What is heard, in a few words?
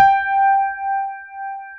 CP70